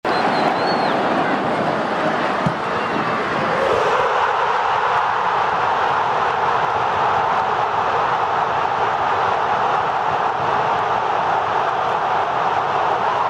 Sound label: Cheering